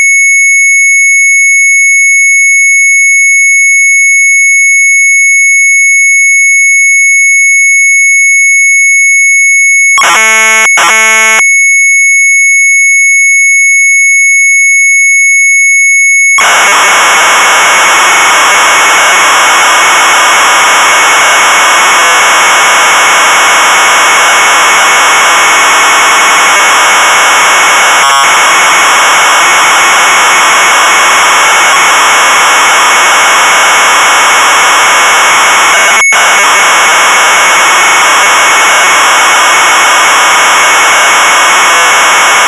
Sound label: Sharp MZ800 Tape; FALLSCHIRM; retromelsarchive